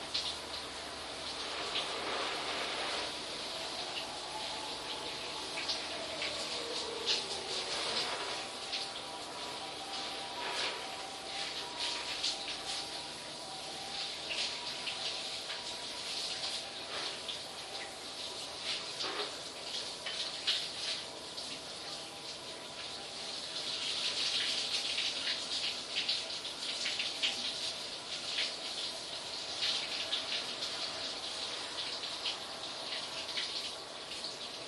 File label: bath siren short big